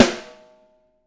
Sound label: 6X12 ALUMNUM, Living Drums